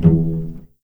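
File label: E1 pizzicato cello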